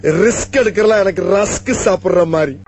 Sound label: Ringtone; Tamil; 2009 Tamil Hit Ringtones